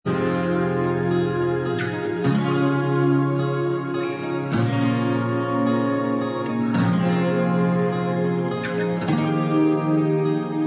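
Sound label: bossa style Instrumental Track, backing tracks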